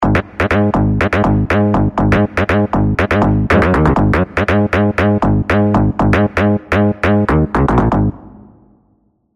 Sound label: SLAP STYLE